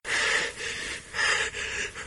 breathless; minetestforfun game; nalc server

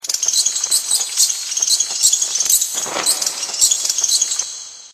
Bats in Cave; server-nalc; ambience modpack

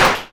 dig; hell

